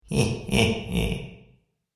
Heh Heh Heh Laugh With Reverb, Evil Laugh

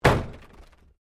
WRECK PANEL CRUNCH, Wrecked Cars; impacts, crashes, scrapes; Objects

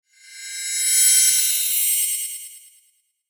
SHIMMER, Shimmer Reverse Delay; Tone

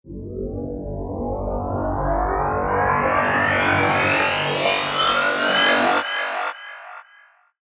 Riser Robot Scifi Reverse Stinger Digital Metal; Sound Design FX; Ascending Sound Effects

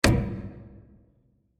Short Stab With Reverb; Miscellaneous Impact; Impacts

